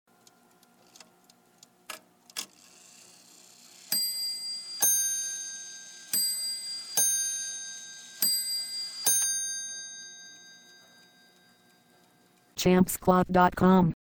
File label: bim bam bell